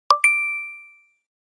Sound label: doorbell sound; iphone sound bell